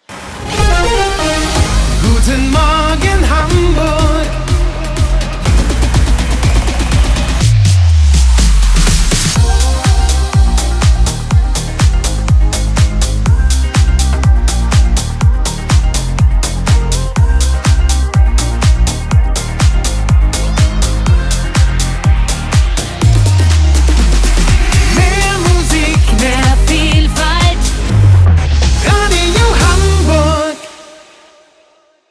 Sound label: Morning Opener; Radio Hamburg